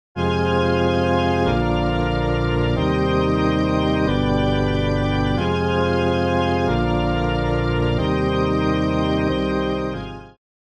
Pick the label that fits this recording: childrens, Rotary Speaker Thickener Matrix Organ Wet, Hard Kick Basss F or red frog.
Rotary Speaker Thickener Matrix Organ Wet